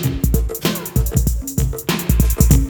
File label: ROBOBEAT, BREAKBEATS